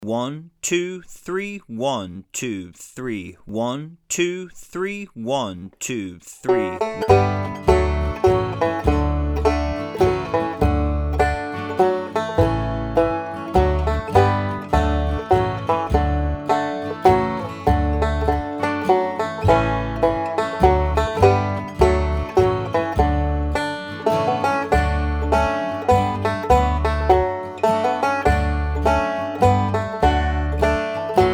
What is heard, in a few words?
Come Thou Fount Of Every Blessing Intermediate Banjo 34 BPM